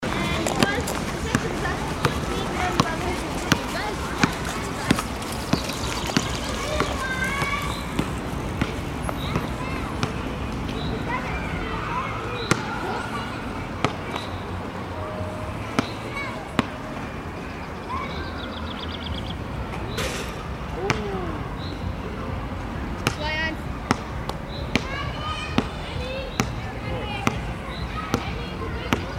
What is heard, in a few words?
playing basketball near to a funfair